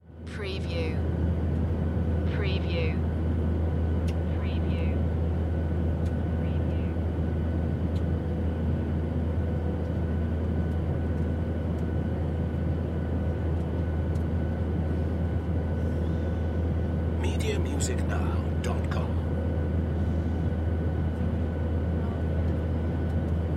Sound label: airplane interior; aeroplane; vehicle transport sounds; Sound Effects Bible